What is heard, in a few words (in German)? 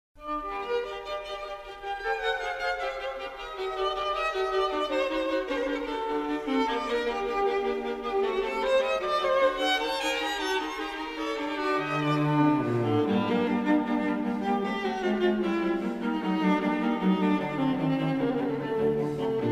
Streichquintett, Allegro; Mozart